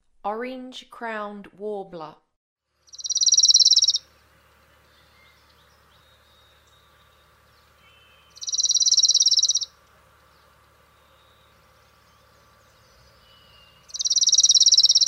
Orange-crowned Warbler; North America

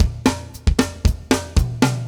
metal

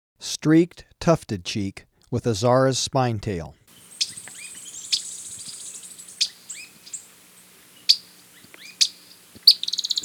Streaked Tuftedcheek, Birds of Ecuador; S. America

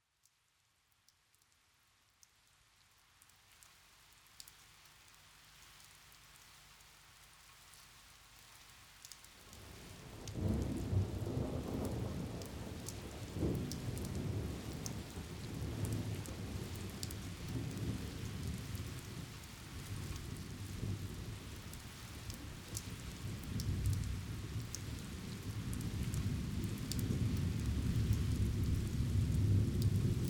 Thunderstorm Out In The Fields; Nature Sounds; Sounds of Nature Collection

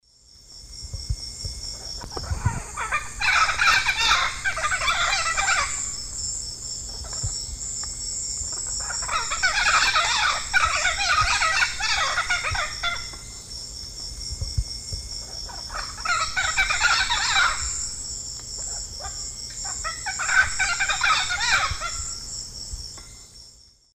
Ara severus, calls pair; S. America; Eastern Lowlands

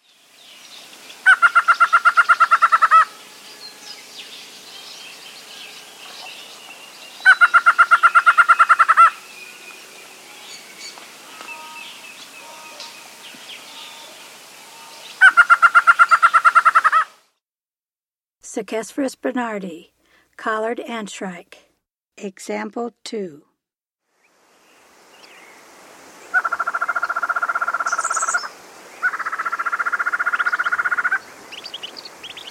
Collared Antshrike, Nature's Songs, S. America

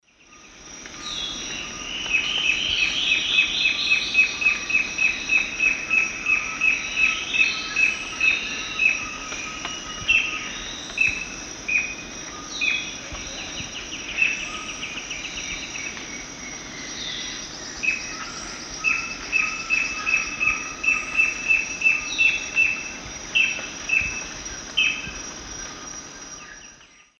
Galbula albirostris, call; S. America; Eastern Lowlands

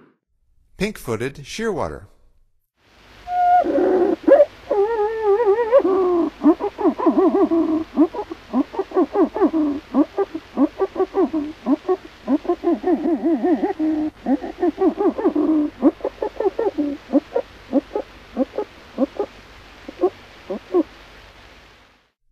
Pink footed Shearwater; North America; Stokes

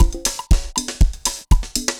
dancefloor perc 120 bpm

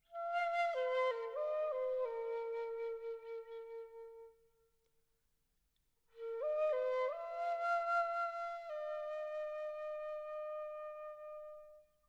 Bamboo Flute Version

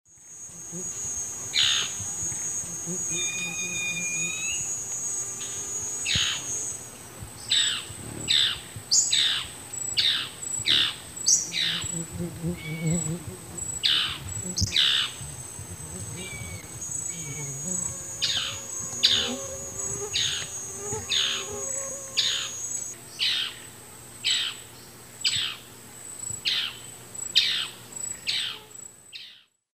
Pithys castaneus, calls and song; S. America; Eastern Lowlands

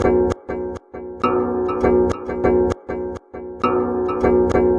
Acker Strings; Electronica

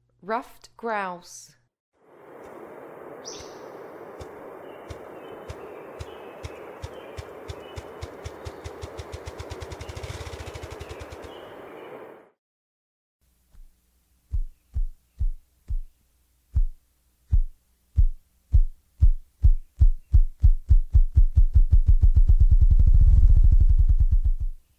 Ruffed Grouse; North America

